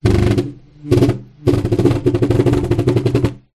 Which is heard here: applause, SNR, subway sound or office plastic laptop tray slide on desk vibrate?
office plastic laptop tray slide on desk vibrate